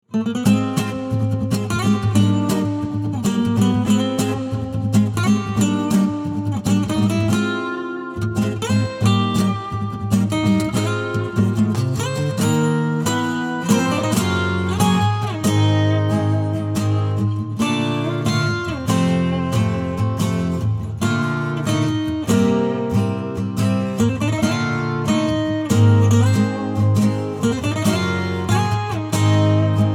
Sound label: Acoustic; Spectacular Finale